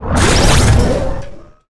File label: Enemy Roadmechanic Active Loop